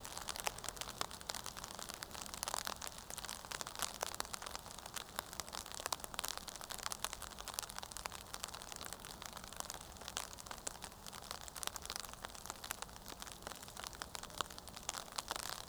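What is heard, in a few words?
Ambience Rain on umbrella; ambient; nature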